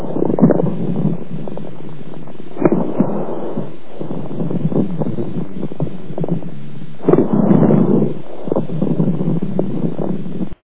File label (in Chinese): 干罗音